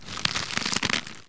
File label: Herb chew, Dino